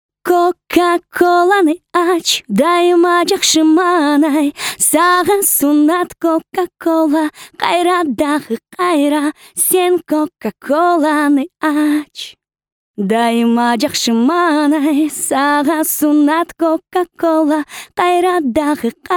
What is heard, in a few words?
voc Kyrgyzstan